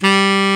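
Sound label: TENOR HARD G, TENOR SAX; Northstar - New Gold VOL-1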